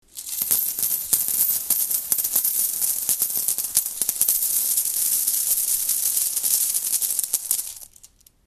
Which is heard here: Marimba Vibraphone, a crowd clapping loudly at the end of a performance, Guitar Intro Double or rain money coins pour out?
rain money coins pour out